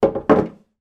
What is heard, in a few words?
household wooden chair place on wooden table